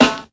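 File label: Snare; Classic Hip Hop Mega Kit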